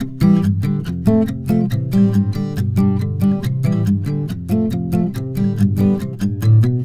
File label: Acoustic Pop Guitar 140BPM Cmaj; Acoustic Pop Guitars; Vengeance - Essential Clubsounds Vol.4; Guitarloops